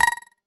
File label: poison tick; Status Effects; freepixel